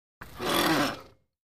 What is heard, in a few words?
Biking Quick Stop